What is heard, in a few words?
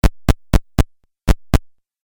Footsteps Video Game